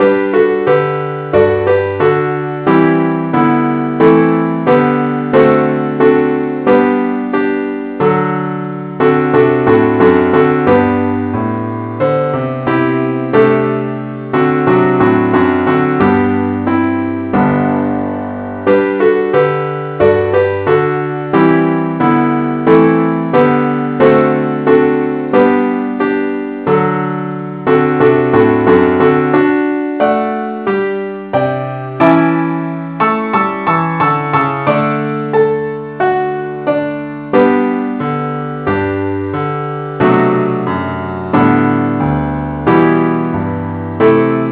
gwalchmai keyboard Only key g

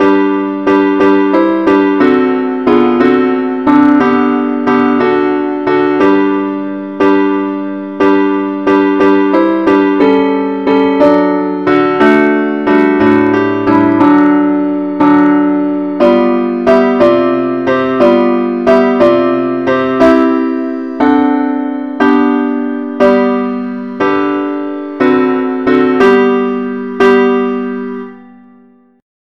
parts only key g; Angel voices, ever singing

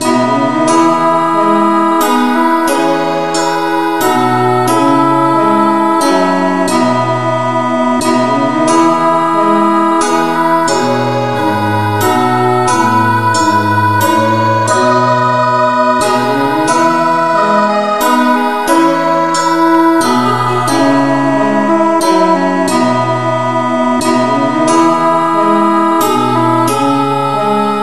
no vocal key E

